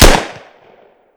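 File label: fire, double barrel; svencoop; hunger; weapons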